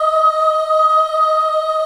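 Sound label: D#4 child ah right, Choir; USB Soundscan vol.28; Acoustic & Synth; AKAI; CHILD AHF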